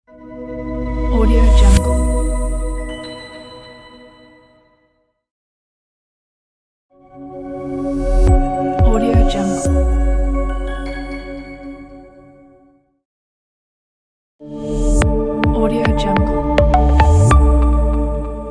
commercial bright opener pack; soundvessel

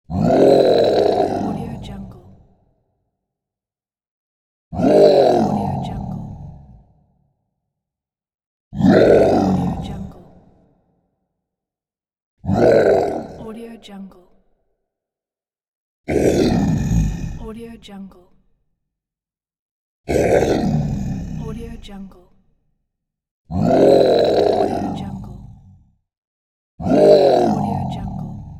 demonic roar

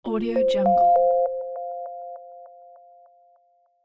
elegant notification

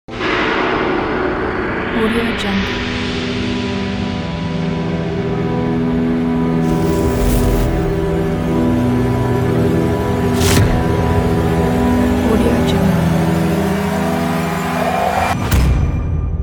eerie horror logo; kipaloops

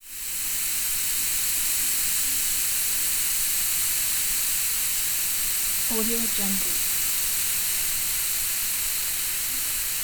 steam

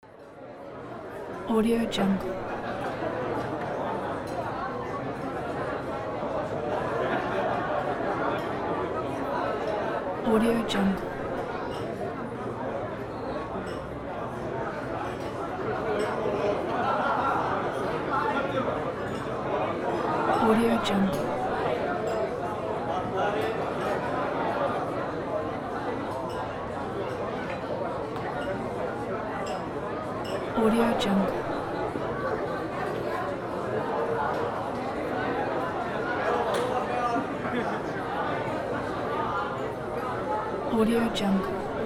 people in restaurant

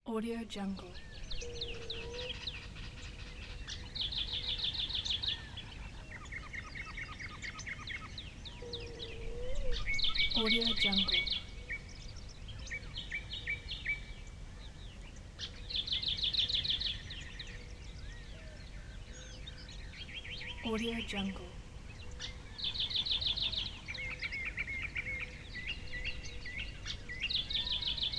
morning nature

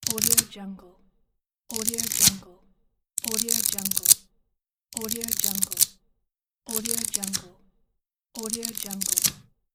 flipping page

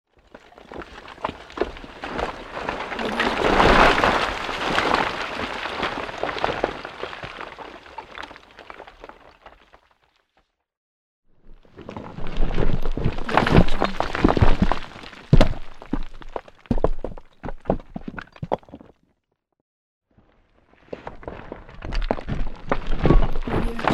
construction waste

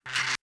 static rough

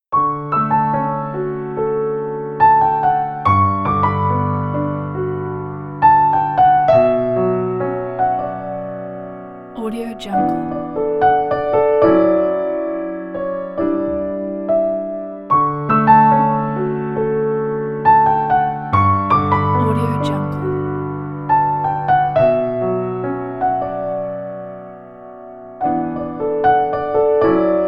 sad piano inspiration

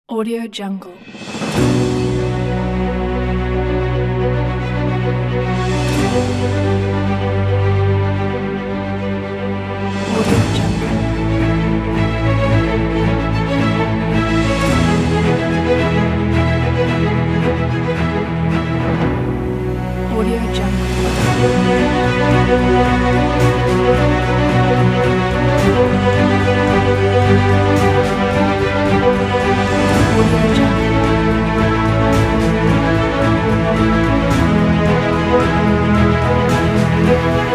cinematic adventure and piano

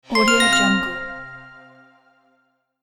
game secret unearthed

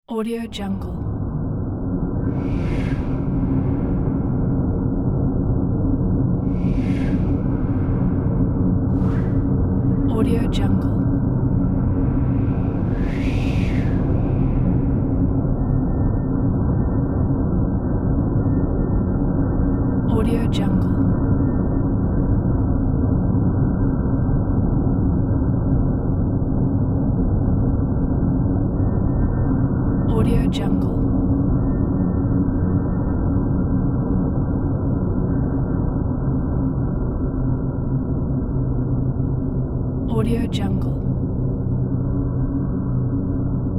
gas giant rumble; sound-ideas

